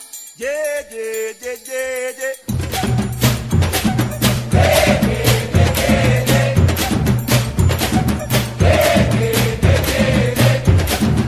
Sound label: Samba Do Brasil